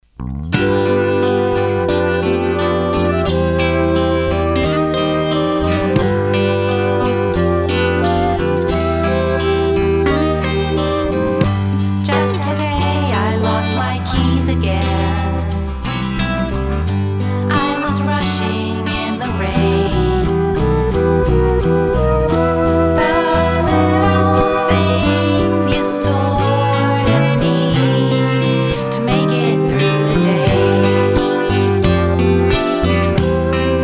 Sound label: Flute by Jeri Sykes; Produced by Fireproof Sam